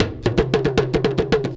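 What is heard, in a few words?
big tama groove; Best Service; World Colours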